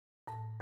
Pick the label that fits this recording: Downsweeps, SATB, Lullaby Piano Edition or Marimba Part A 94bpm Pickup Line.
Marimba Part A 94bpm Pickup Line